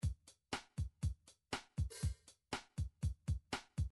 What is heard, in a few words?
midi drums drums